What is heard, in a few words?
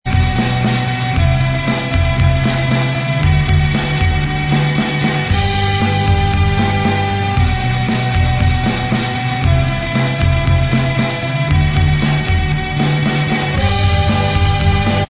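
Inst Track, instrumental track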